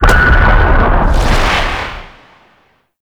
Infested Radio Tower Speaker Wave; Warframe; Ambience; NineteenNinetyNine

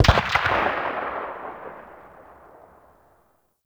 Weapon Enemy Tail Outdoor; Weapons; Warframe

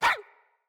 Puppy Idle Bark; Kubrow

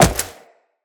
Wall Jump, Footsteps; Wall Run; Characters; Tenno

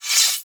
Machete Finisher Equip; Warframe; The New War; Kahl; Weapons

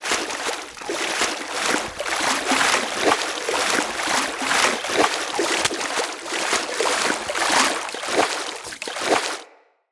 Horse Step Gallop Loop Water; Mendicant Horse; Foley; Footsteps

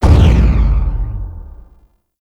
Ability Cast; Warframe; Warframes; Cast Start

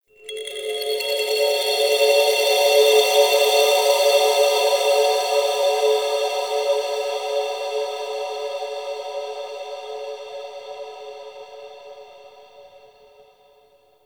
Kalimba; Ambience; Warframe; Nokko Colony

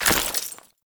Bayonet Melee Whoosh Gear Accent; Warframe; Tenno

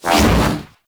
Hoverboard Jump Spin Fast B; Vehicles; Warframe; Venus Hoverboard

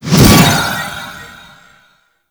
Smite Projectile Deactivate; Warframe; Paladin

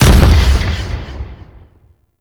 Rifle Projectile Explosion, Weapons; Warframe; Zariman Grn Wraith Rifle

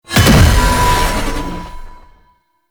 Medic Aoe Wave Impact; Abilities; Warframe; Tau; Dax Medic

